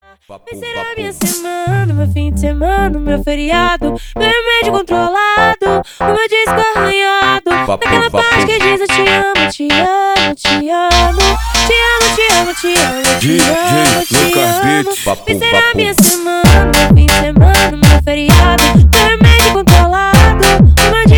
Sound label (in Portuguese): Disco Arranhado Remix Beat